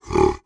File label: Animal Primate Ape Gorilla Snort; animals; AdobeCC